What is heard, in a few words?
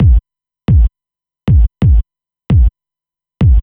Kick Loop, Dance Beats